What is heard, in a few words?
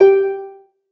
lyre